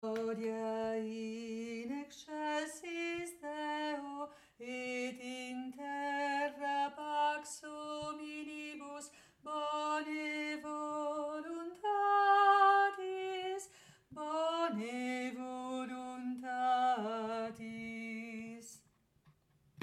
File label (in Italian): gloria contralti; anno liturgico